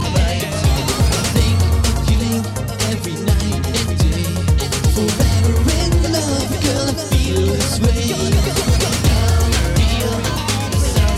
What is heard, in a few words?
salsa; Latin